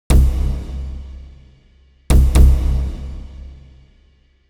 Synth; プロの音プロの技・令和版

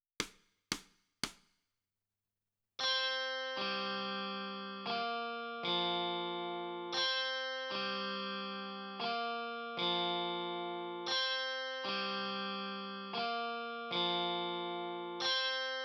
Guitar

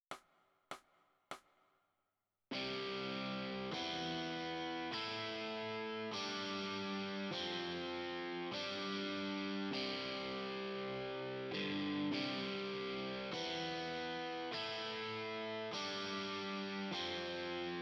OverDrive Guitar

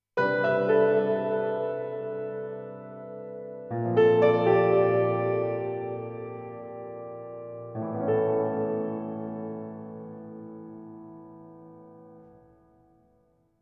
Piano Distant; プロの音プロの技・令和版

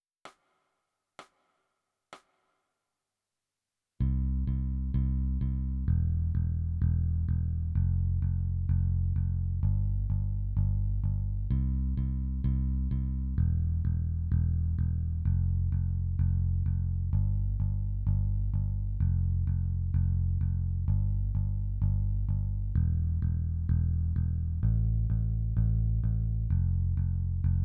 Bass

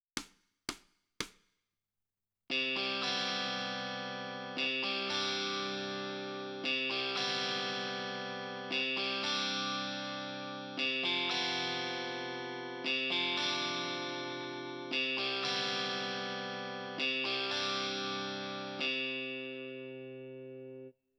Guitar Left

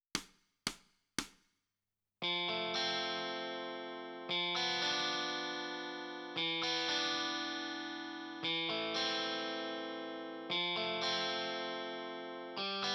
Guitar Right

